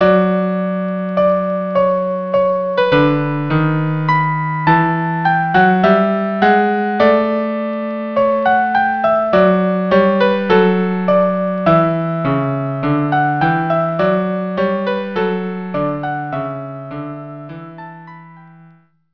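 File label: violin cello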